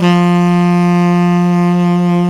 SAX Tenor Breathy F 3

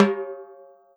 timbal hi; E-MU Producer Series Vol. 7 – Old World Instruments

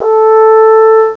FRENCH; CMI Sound Library Rev 1.3; BRASS

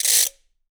RATCHET; Big Fish Audio; Ross Garfield Drums VOL-2

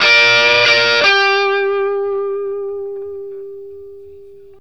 BLUESY G 60, Vintage Blues Guitar; USB Soundscan vol.22

